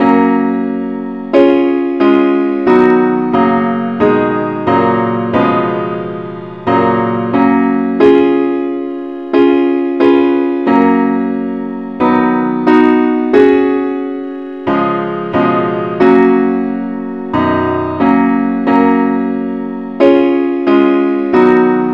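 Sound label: gwalchmai advance Accompaniment key ges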